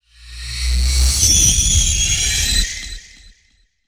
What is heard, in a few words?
Iron Scrape Reverb; Sound Design - Fantasy; Sony Pictures Sound Effects Series Volumes 1-10; Sony Creative Software